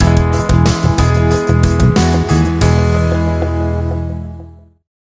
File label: Ultimate Success Sting